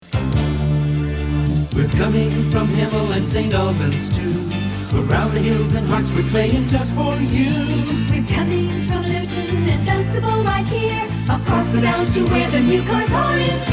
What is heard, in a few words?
Chiltern Jingles